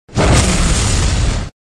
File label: gravity hammer swing; Novetus